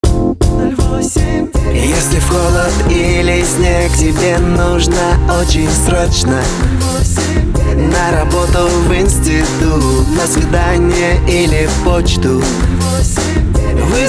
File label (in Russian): vokal